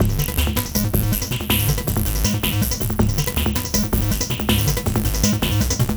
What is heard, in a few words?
FRANTIC PULSE, ARPEGGIATOR DRUM MACHINE; Sonic Foundry (Sony Creative Software); Vir Unis Infusion